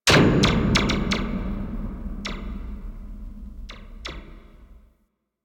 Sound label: Environment Cable Impact; Warframe; Teralyst; Ropalolyst